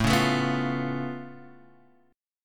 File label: Db Augmented 7th A